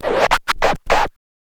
scratch kit, vinyl

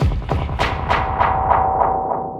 drbreak, drumbreaks; Masterbits; Vinyl Attack

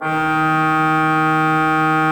D3, Cathedral Organ, HOLZPRINZIP; Propeller Island

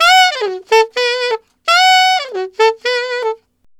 Alto in Gb, Sax; Sonic Foundry (Sony Creative Software)

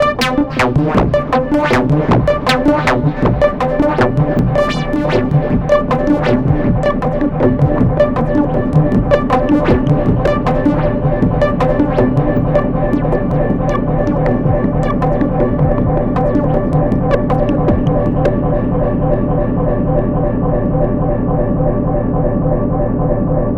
Rising Text, Pads; KJ Sawka; Drum n Bass & Beyond

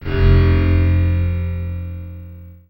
Zing; SFX & One-Shots

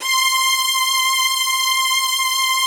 PAD, DIGI-PADS

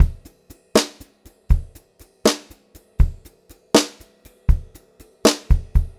Brushed Drum Loops; USB Soundscan vol.39; AKAI

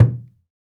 African Perc

